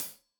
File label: Hat Tight, Hi-Hat, Cymbals; Deeply Sampled Drums